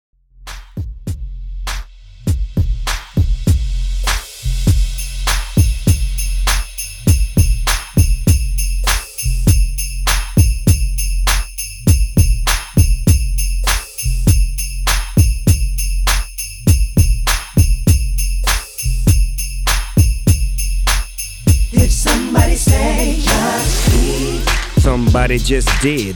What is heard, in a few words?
XMAS REDRUM 100 BPM